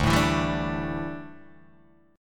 D Suspended 2nd Suspended 4th D down Guitar Open D